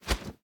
flip, Insects

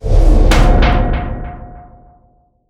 Failure Slam with delay; Bust n Rush

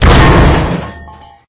Vehicles Generic

